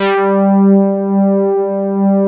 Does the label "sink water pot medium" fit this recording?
No